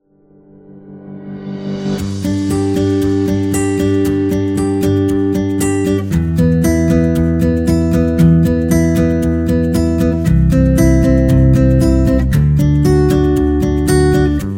Guitar Positive Acoustic; happy music vol 5

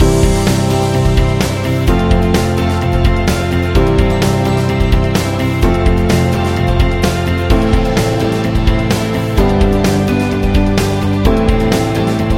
Corporate Upbeat Loop Business

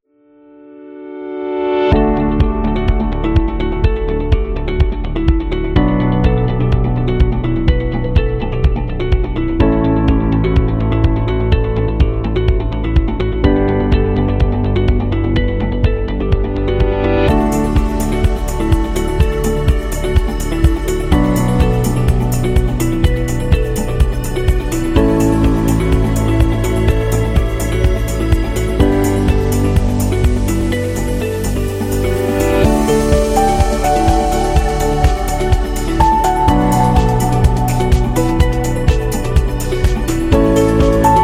Background Corporate; corporate interview

